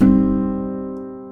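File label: PIANO MINOR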